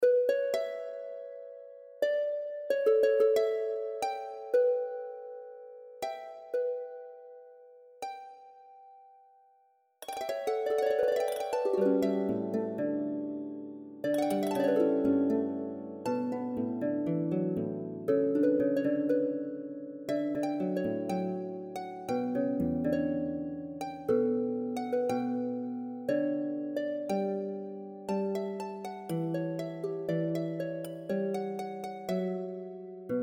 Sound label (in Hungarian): harfa